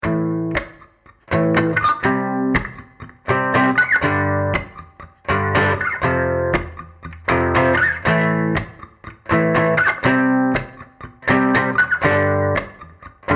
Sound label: Am rock